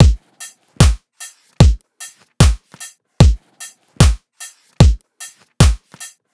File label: Dubstep Drum Loop Full, 150bpm; Stem Seperated